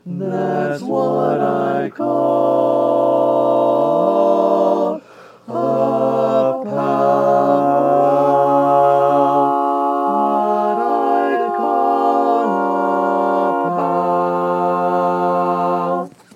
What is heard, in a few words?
barbershop tags, all parts